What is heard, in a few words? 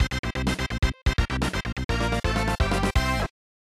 Unused Jingle; super play action football; snes